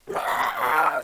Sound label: idle, zombie; DayZ-Epoch; dayz sfx